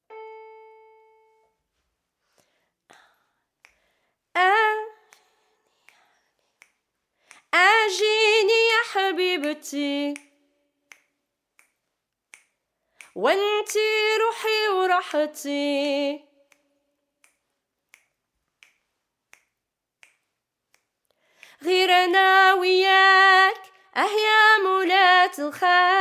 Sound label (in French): CHANT GROUPE REPONSES VOIX